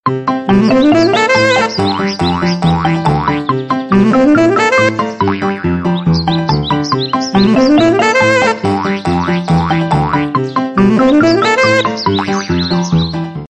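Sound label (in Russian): заячья мелодия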